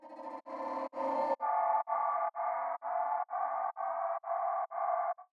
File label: Scream In The Cellar; SFX; Ambient - General